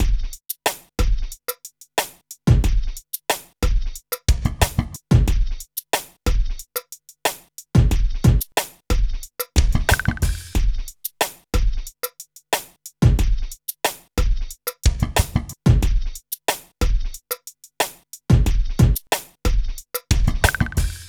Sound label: Drums, Hip Hop